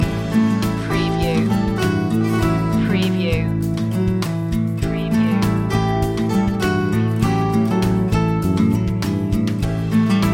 outback ramble loop

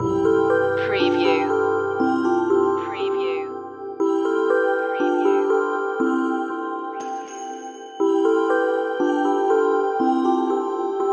fairy dream magic loop

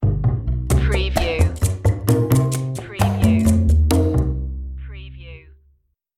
jazzy opener underscore

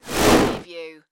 Whoosh; Sound Effects Bible

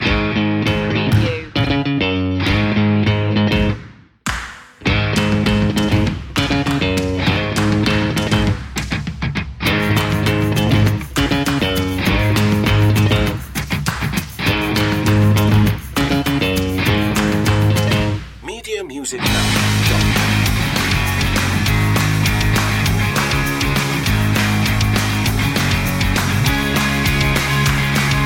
no lead guitar

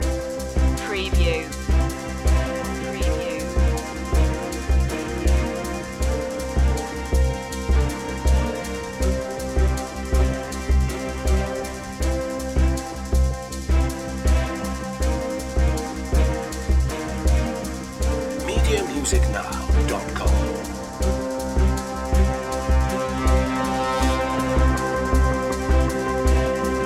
informative news loop

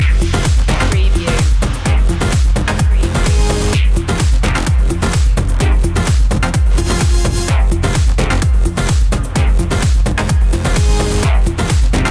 tribal drops

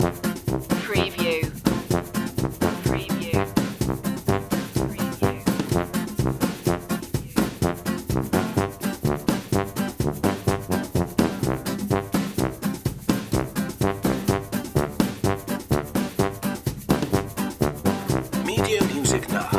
cajun gumbo loop

